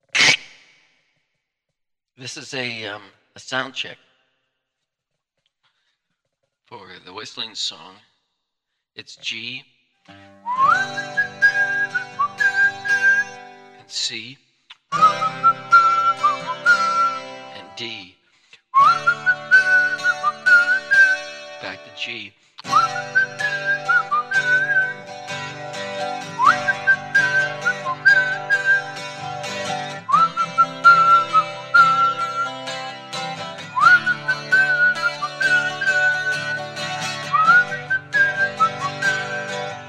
Whistling Song Soundcheck

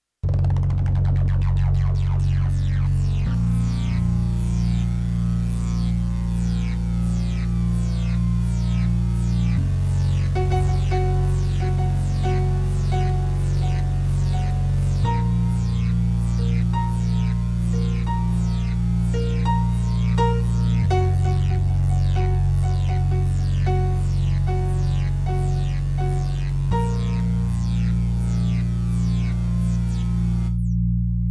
Cha Cha

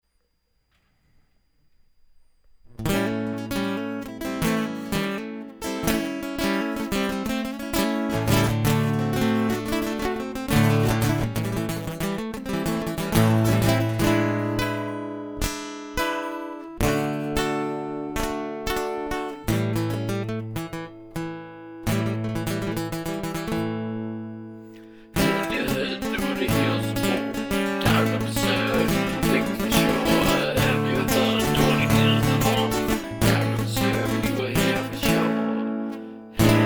Unplugged

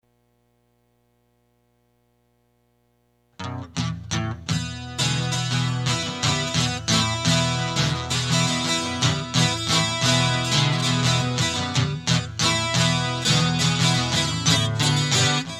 Guitar

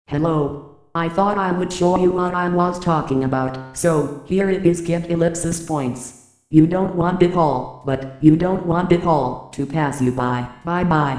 Song Voice Synthesizer

